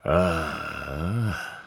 あーあぁ…

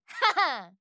ッハハー！

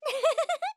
うふふふふ

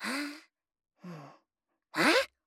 ハァ…ハン

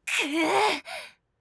くうぅ…！！

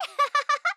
アッハハハハ

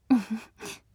うふふ

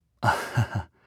あっはは…

